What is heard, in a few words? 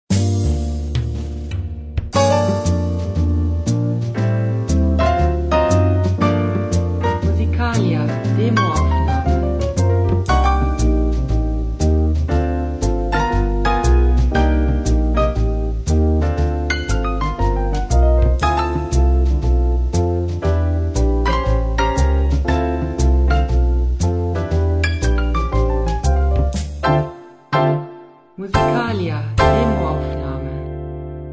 jazzy